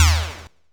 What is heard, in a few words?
laser Large, futuristic weapons sound effects